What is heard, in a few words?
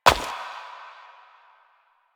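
Step Flesh, footsteps; Warframe; Koumei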